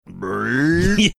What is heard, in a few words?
imitating burp